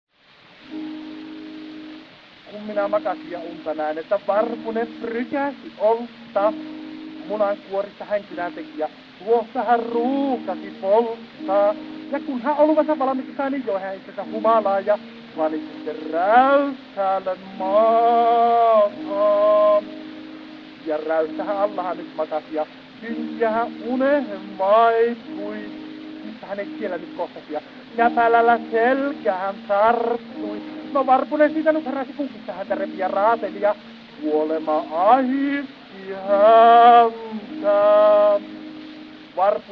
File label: Gramophone